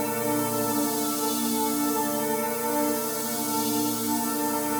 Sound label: Pad Loop G, shimmer and sparkle; Pad Loops